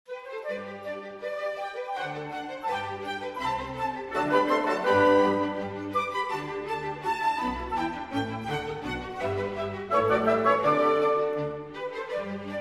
Piano Concerto in G Major, Allegretto; Piano Concerto No. 17

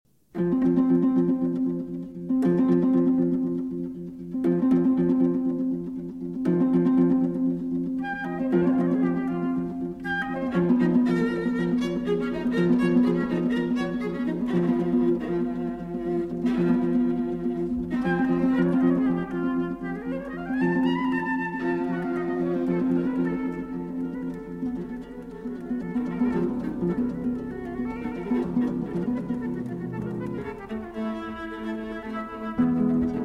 Sonata For Flute, Viola and Harp Finale

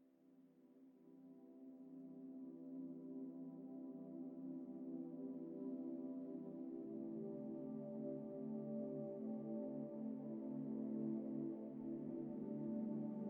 Calming River Melody

